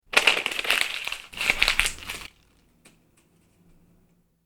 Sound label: squeeze paper